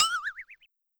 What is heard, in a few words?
doingoing; HeavenStudio; cheer Readers